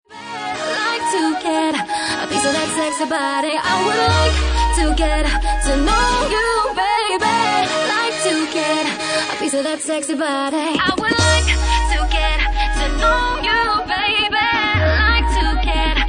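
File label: Organ Mix, Bassline House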